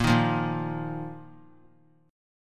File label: A sharp Suspended 4th; strummed; A#sus4; JGuitar